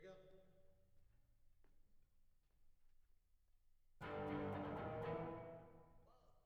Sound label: Kit L